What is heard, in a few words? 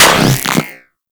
Aim; Tentacluster Aim 2D; Warframe; Weapons; Infested Tentacluster